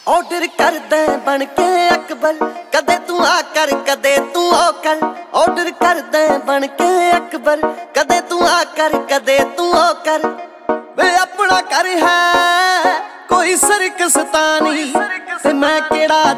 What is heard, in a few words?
Punjabi Cool Song Ringtones